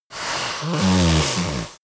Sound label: yeti nose blow strong; kreaturen